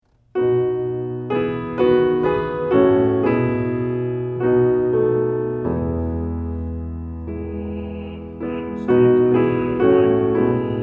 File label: Piano; The Heavens Declare Thy Glory